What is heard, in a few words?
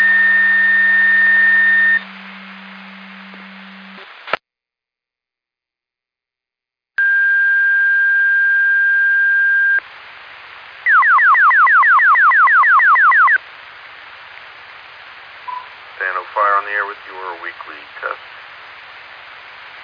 Siren; Hamburg FD